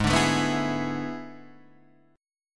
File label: A Major 7th Suspended 2nd Suspended 4th Ab